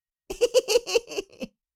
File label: Cartoon little child voice laugh laughter